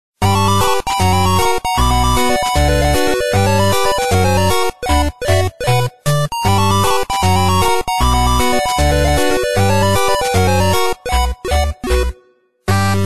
Skeleton Music; timothy and the mysterious forest ps4 switch windows gamerip 2019

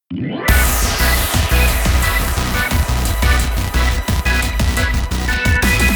Rapid Loop Intro; Murasama; Android

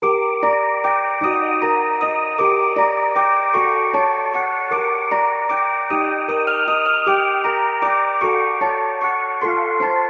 Quiet Place; Ragnarok Online 2: The Gate of the World

